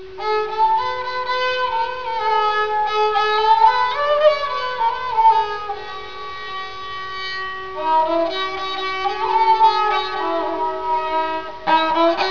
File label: sarangi